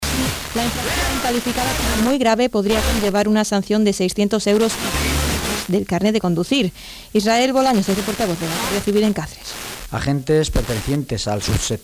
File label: spain, stations